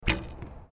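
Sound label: archery beginners bow firing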